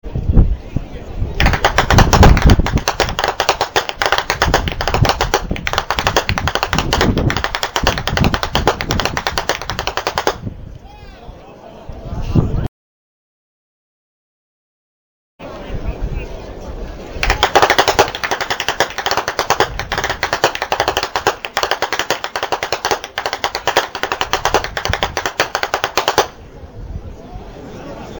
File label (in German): schnalzen; ainring